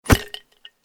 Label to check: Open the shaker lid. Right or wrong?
Right